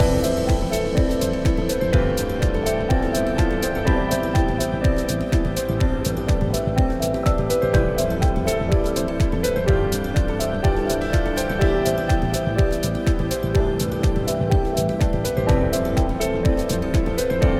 loopable, inspiring melody fantastic electronic emotional; astria blinds oga remixed version 2